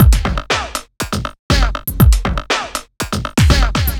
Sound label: Beat, Drums & Percussion; Electrocution; Beats